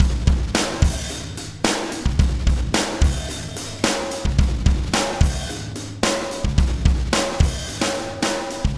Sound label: Beat, Processed Drumkits